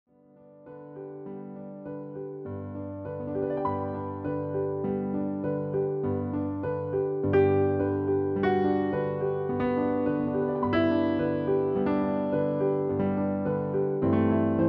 Piano Duet; Saint-Saens; The Swan

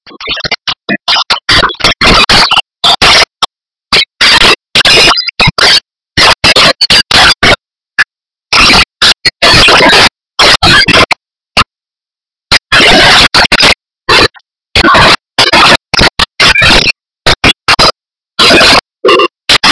rain and thunderstorm sounds

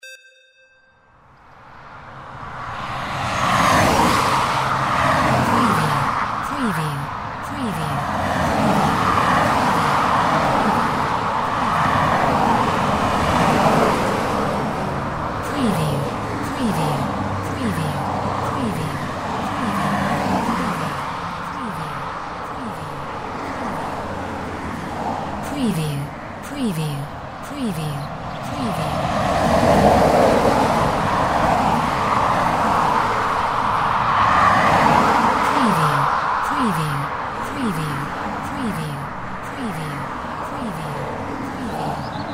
MOTORWAY STEREO; windy beach audio

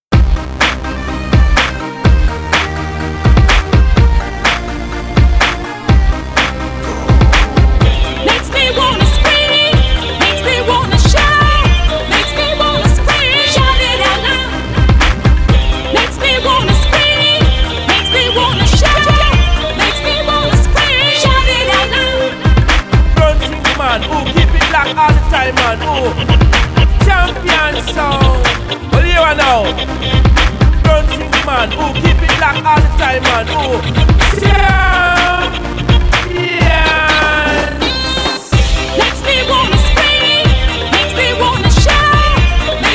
Urban

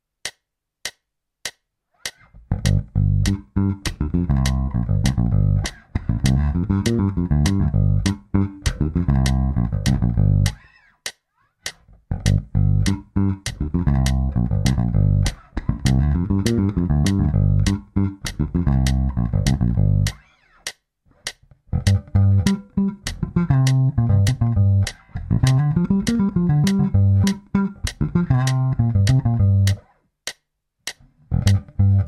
G minor pentatonic line w fill

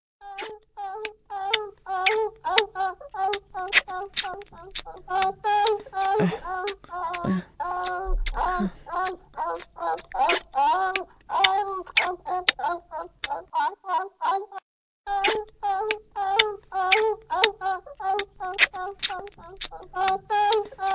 Baby Kissing

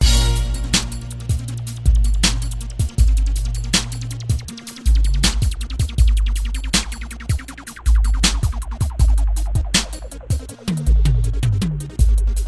beat

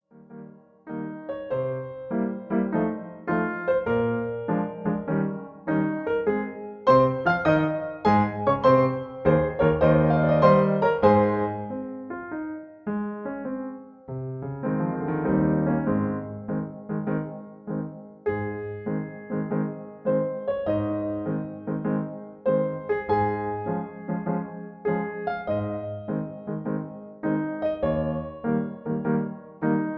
Accompaniment Aflat

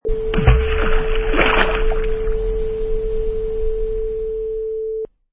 Rock Splash in Water

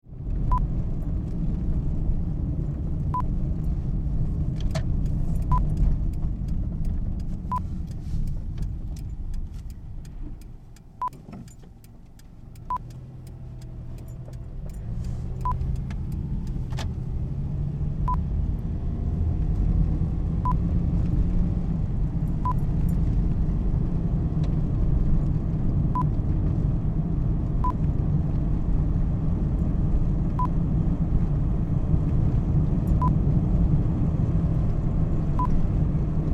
Car Interior On Road Subaru

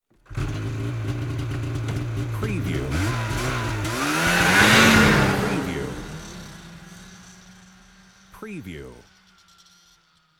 snowmobile take off

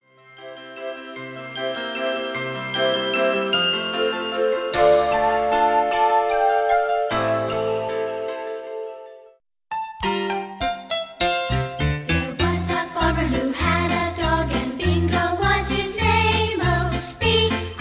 CHILD

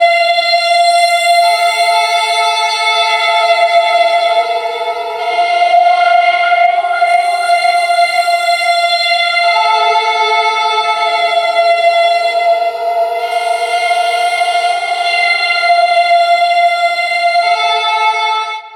Spooky Voices

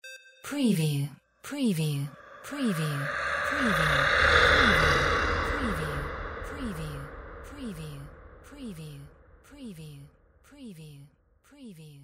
sci fi dragon sweetners; windy beach audio

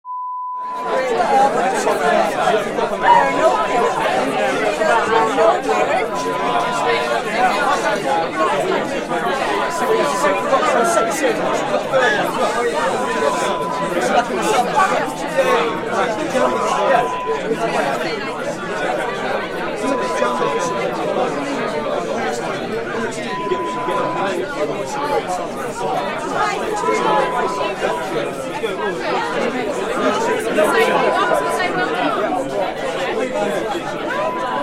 Crowd sounds

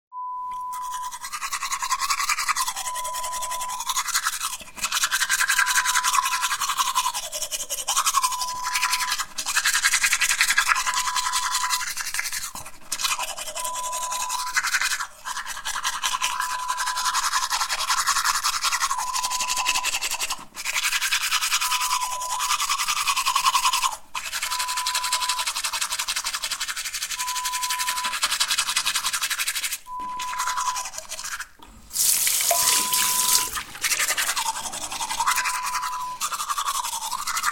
Brushing teeth sound effect

